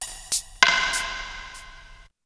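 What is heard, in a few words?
SPACE FILL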